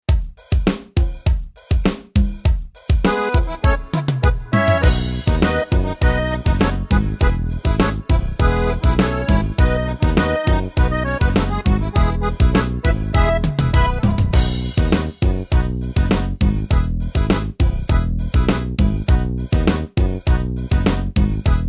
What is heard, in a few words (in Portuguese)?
ritmos yamaha milonga